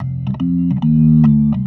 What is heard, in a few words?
B3 LOOP F, PLAYED